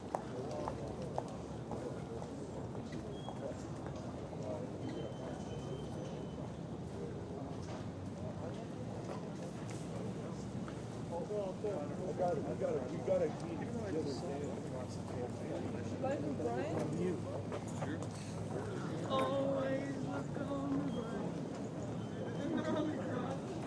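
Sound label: College Campus Outdoor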